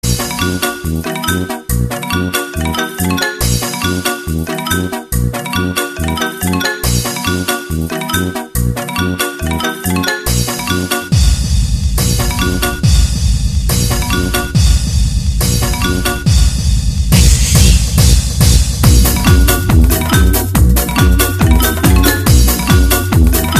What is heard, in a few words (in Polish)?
Cymbalki; techno